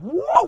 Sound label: fall